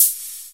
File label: button dry noisy sharp close; suhoy klik